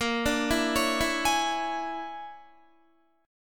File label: A sharp 7th Sharp 9th Flat 5th, down, Guitar; A#7#9b5 arpeggiated; JGuitar